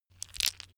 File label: stone gravel clatter short; natur-tiere; steine